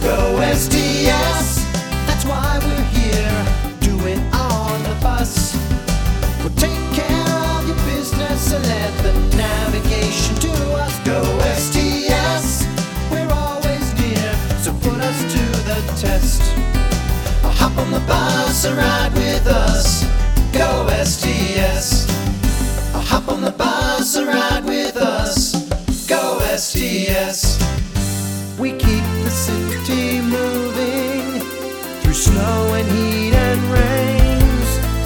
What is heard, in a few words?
Transportation Jingles